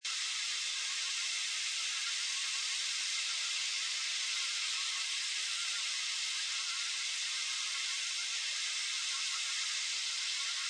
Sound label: Ambience Jungle Night Loop, Night jungle ambience. Ambient rainforest evening featuring frogs; frogs, crickets, insects. Seamless loop; Lynne Music